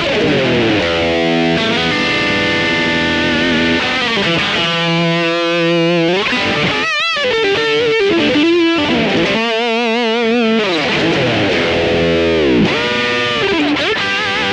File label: Vox AmPlug Treblebooster High Gain